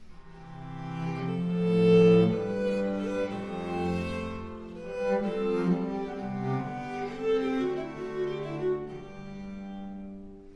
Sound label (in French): viole